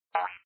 boink; papa louie

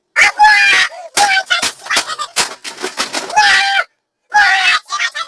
destroy monitor sped up

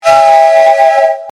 iphone choo choo

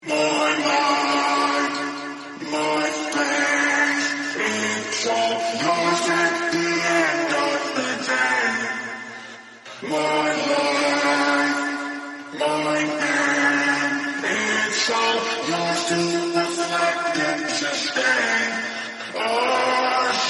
vocals

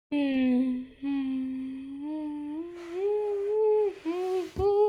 hmm thinking

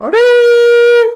trumpet

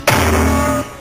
cats bong

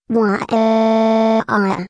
chibi crying; miku; goanimate

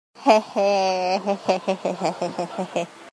hehehehehhehhehhhehh

